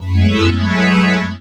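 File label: Pad Synth; Equipped Music; Loungin House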